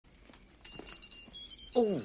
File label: watch alert; juno